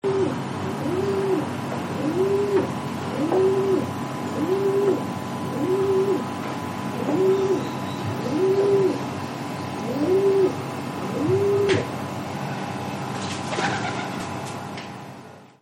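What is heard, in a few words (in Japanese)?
dobato, rock dove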